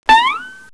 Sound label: high rise